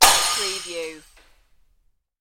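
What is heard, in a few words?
Plate Break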